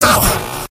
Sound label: pain sharp; engineer; mvm